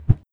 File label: big, wooshes